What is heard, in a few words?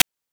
PITCHED NOISE; NES DPCM; MIDINES